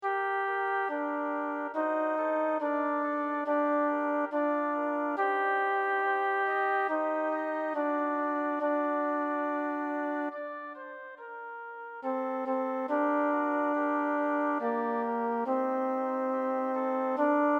1. VOZ; Ya los días son cortos

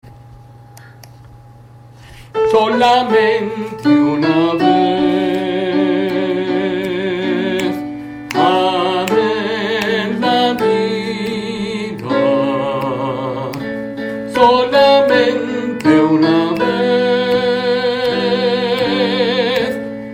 Soprano

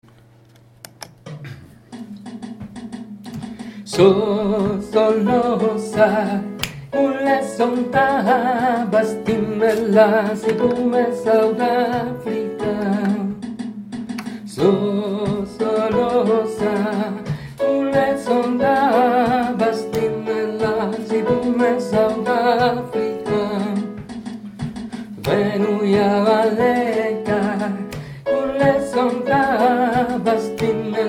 Tenores; shosholoza

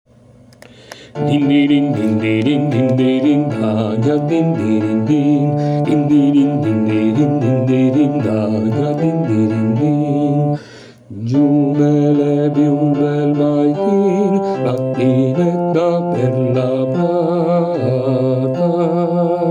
Bajo; Dindirindin

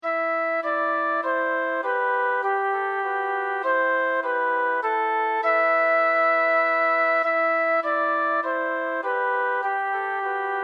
flauta; La faz del invierno

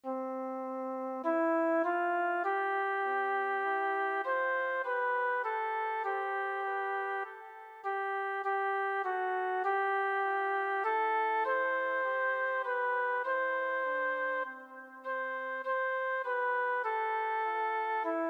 2 VOZ; El claro sol

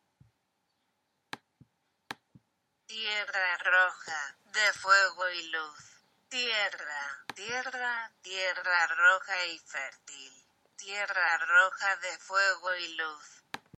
español; Tierra roja

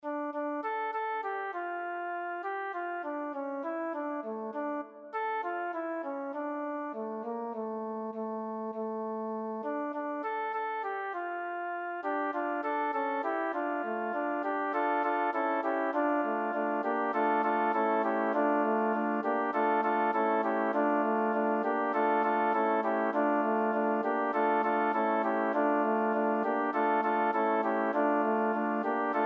4v.

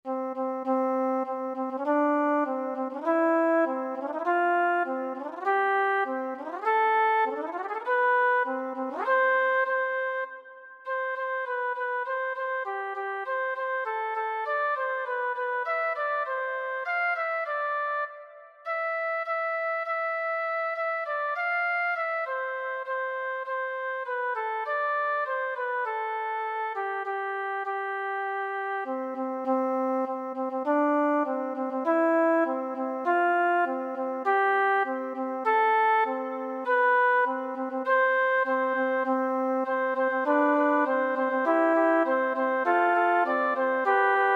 Los intervalos

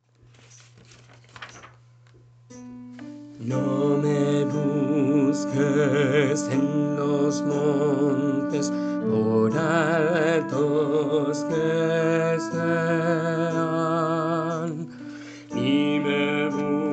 Contralto; Miguel Hernández

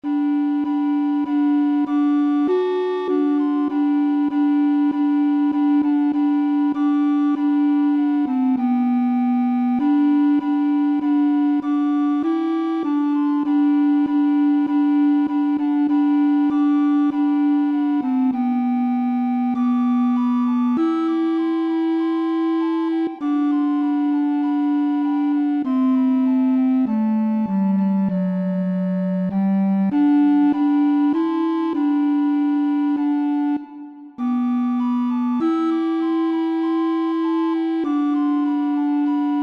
flauta 3VOZ

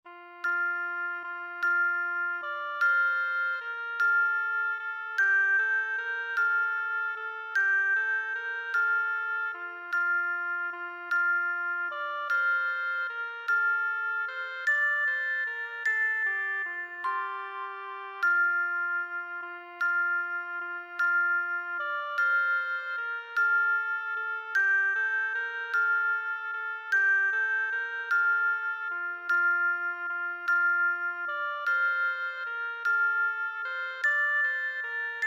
INST; 3 barcos Adviento

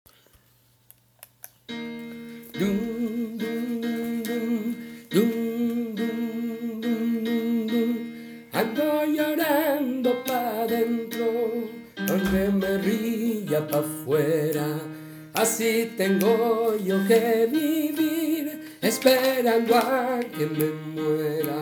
Tenores

